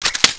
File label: elite clip in